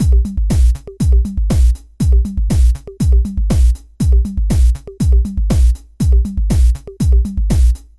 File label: drums